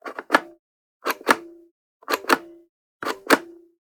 Generic Button Metal Medium

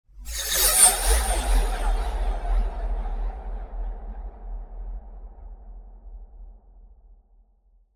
Whoosh Sharp Echo - Transition; Hollywood Edge; sound designer's toolkit v2

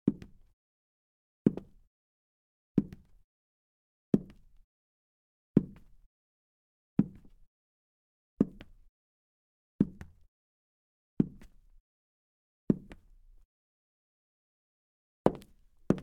Wood Parquet Dress Boots, Foley Footsteps; Sound Ideas

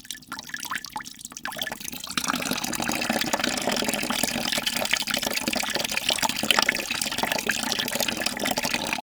water filling; best service Blue Box; water fx

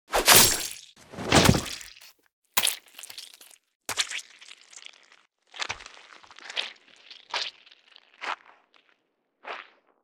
attack death; llorona; DeadFright

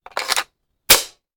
Machine Gun Foley; Sound Ideas; Guns

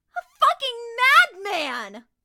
town human far; Blind Drive

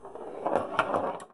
Pinball Ball Roll

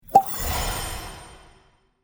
Major Health Potion, Small Spells; Monument Studios

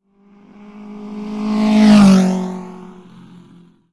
motorbike; Blind Drive

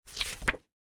Magazine Open; Office Supplies; 1001 Sound Effects

